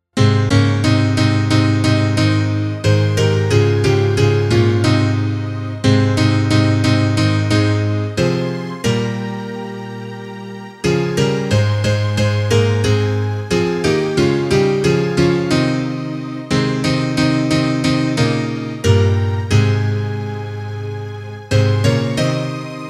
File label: instrumental